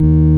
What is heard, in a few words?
ORG VOX D#, Continental; Roland LCDP09 Keys of the 60s and 70s 1